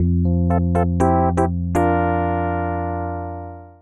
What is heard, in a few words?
DIGIJAZZ; East West; Twisted Textures-BT